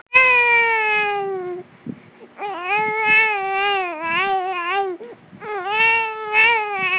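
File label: baby tired, male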